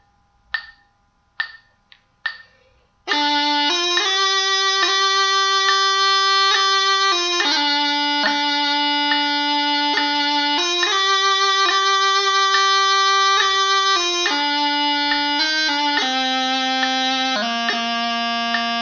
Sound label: Hallelujah Melody Harmony